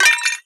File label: pottery impact; physics